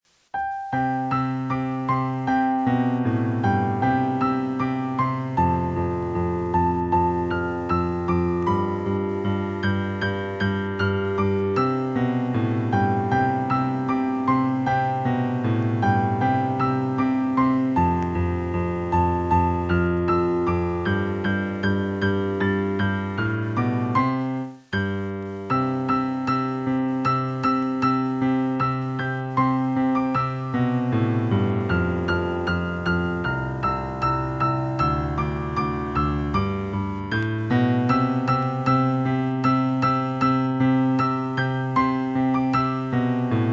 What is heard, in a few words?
jingle bell